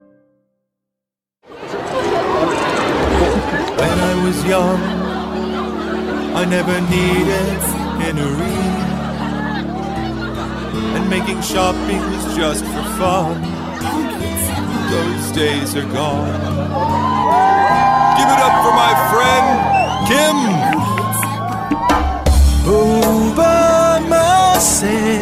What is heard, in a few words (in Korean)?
영어남 Commercial singing; 남자